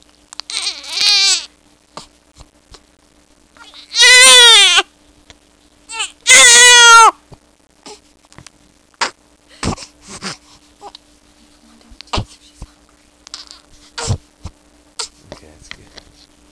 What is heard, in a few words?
cry